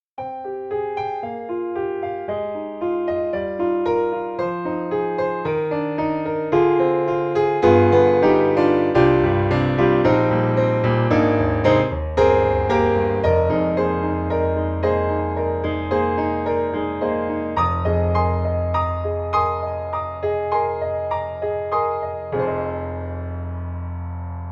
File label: Mini Grand Upright Piano; AIR Music Technology